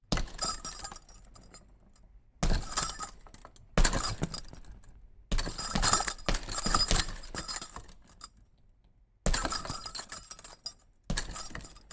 gym boxing punching bag hits chain perspective; sonniss gdc

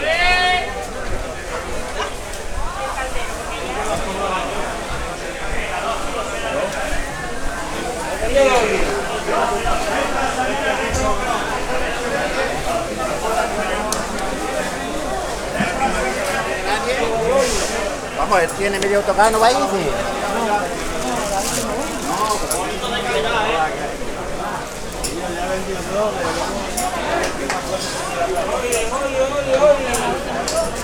market, Town; Loops

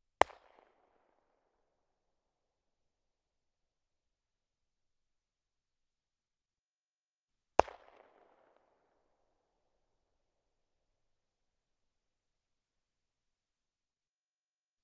glock 17 firing 100m in front off axis; ortf mkh8040; Sonniss GDC

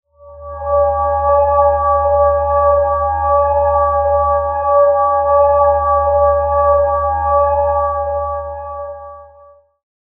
light, cantrips; psfx

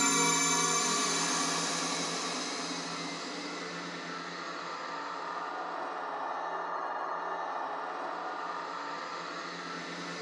Astral Wave; Cyre Lightning Rail

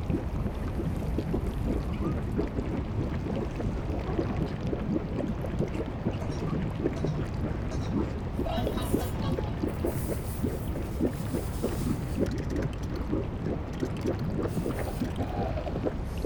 Lava Plains, ambiences; Michael Ghelfi

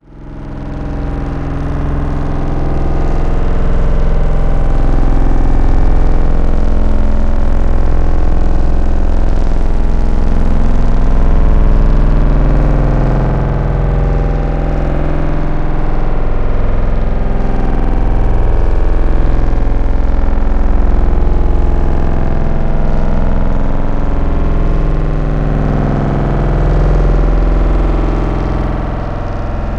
cinematic ambience; sonniss gdc

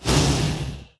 Autopod Fire, weapon

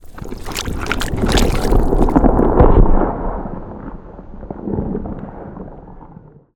organic texture water; bluezone; sonniss gdc

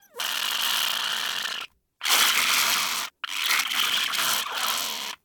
obnoxious sip water bottle; sonniss gdc

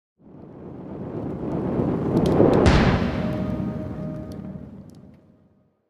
sacred flame target; cantrips

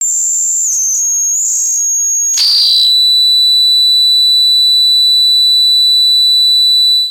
high frequency feedback loop; sonniss gdc

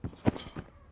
trampoline realistic

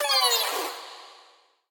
fail; sonniss gdc

